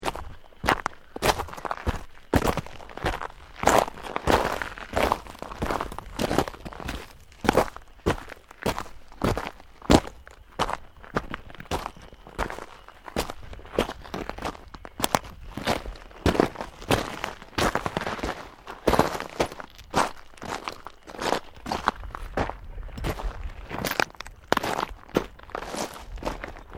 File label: Footsteps Boots Volcanic Rock Sequence; Footstep Foley; Human